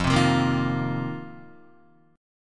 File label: F 7th Suspended 4th F down Guitar